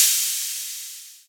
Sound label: big crash single shot f key